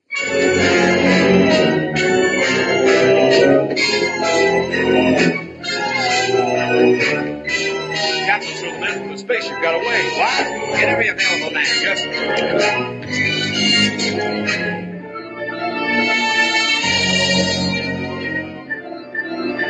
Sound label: dramatic music; the day the earth stood still